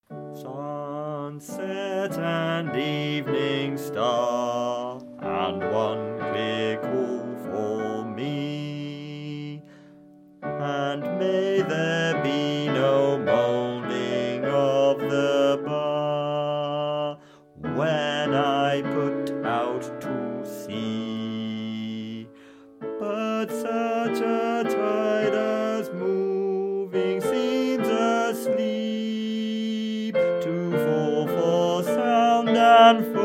Bass; Crossing the Bar

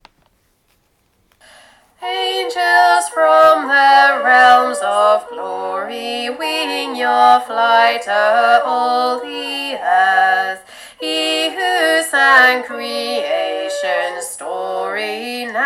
Angels SATB verse, Christmas Choirs

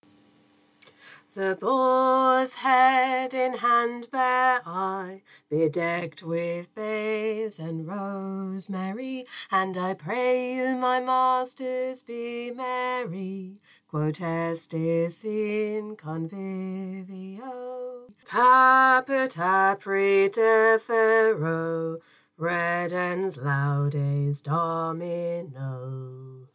bass, choir; Choir Christmas 2023

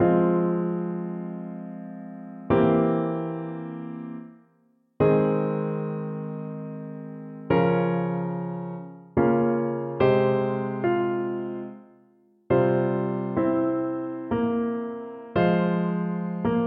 Amen Backing; Christmas Choirs

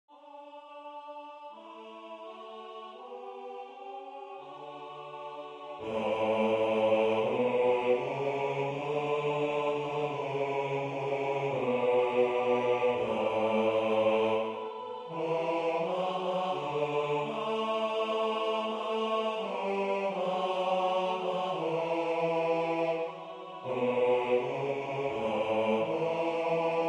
Non Nobis Domine BASS; Choir Spring Summer 26

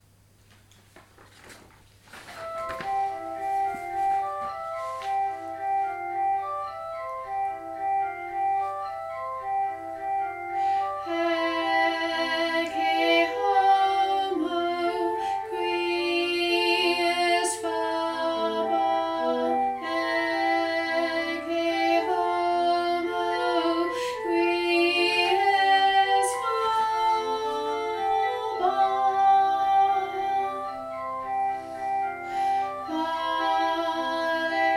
Ecce Homo Alto, Choir

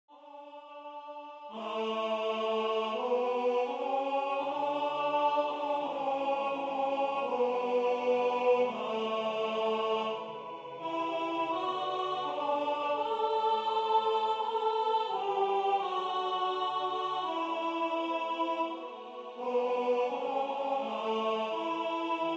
Non Nobis Domine ALTO; Choir Spring Summer 26

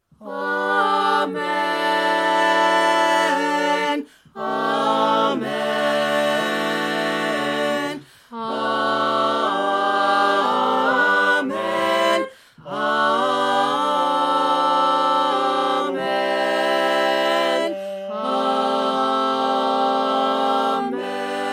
Amen; Bracon Ash Amen

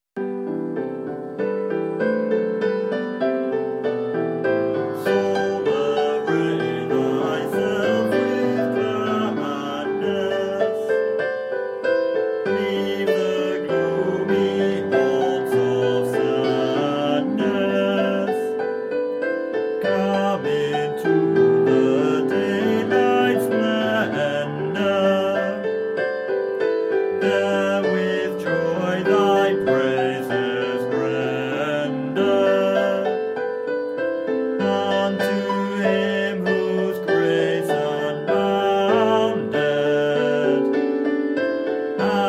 Bass; Soul Array Thyself

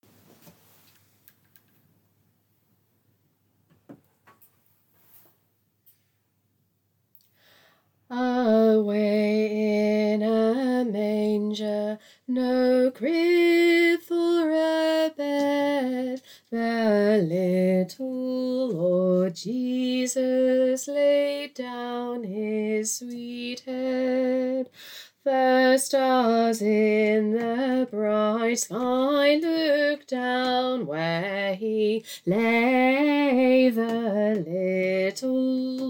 tenor, choir; Christmas

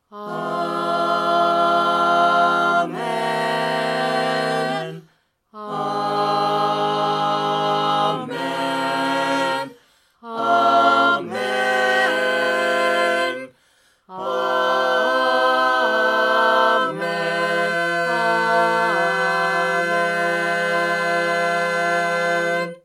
Amen, Choir

